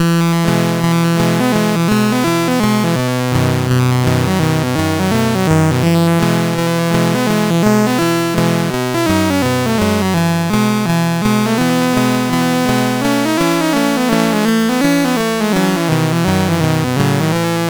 sid CSG8580R5

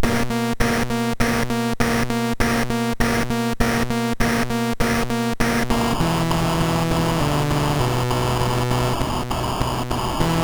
Drums and Stuff, MOS6581R3

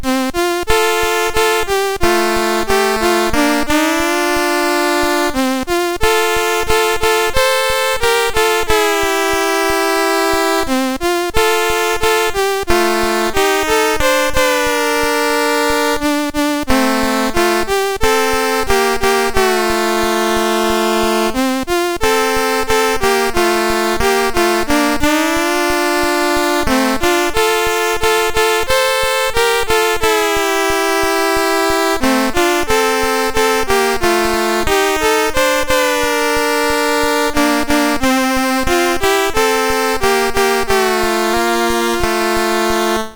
Red River Valley MOS6581R2

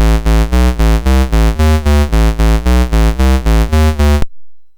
Theme, MOS6581R2

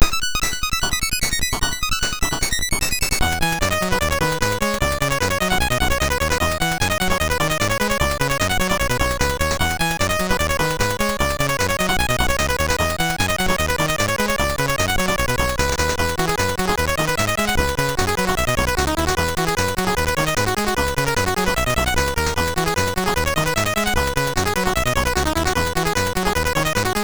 sid MOS6581R3

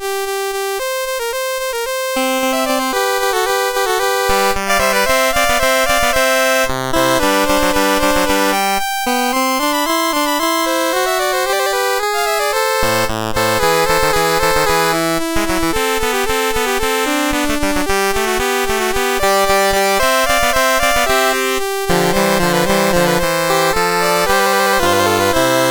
Fugue, MOS6581R3; Fugue A

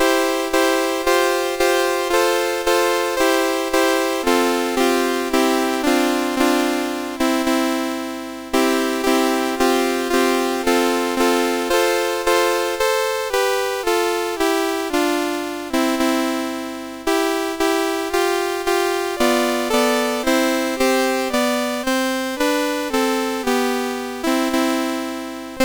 Chord Progression Piece; CSG8580R5

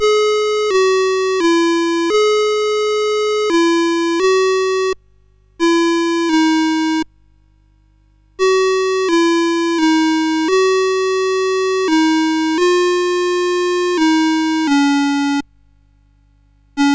sid CSG8580R5

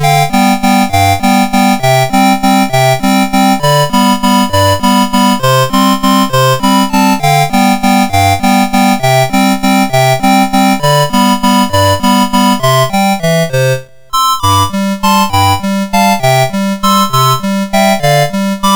Chopsticks, MOS6581R2

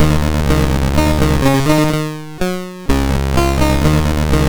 MOS6581R4, sid; Rebels

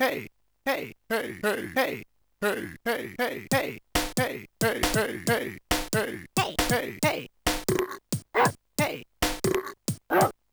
Dynamic Drums Song; Oakvalley

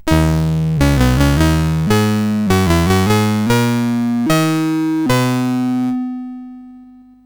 sid CSG8580R5; Gumshoe

